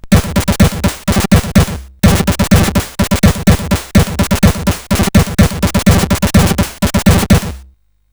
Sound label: Jingles, sid, CSG8580R5; Supremacy